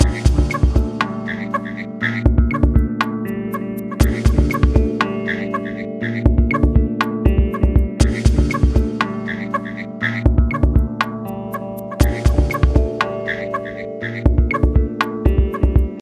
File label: Music Frog Ride Theme; wii gamerip; mushroom men the spore wars